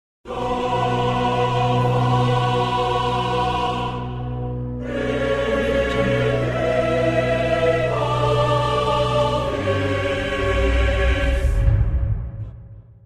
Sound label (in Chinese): 激情的背景音乐